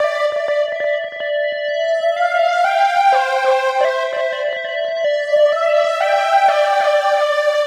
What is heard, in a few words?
Hover Lead, lead